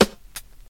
90s warm sounding hip hop snare single hit g sharp key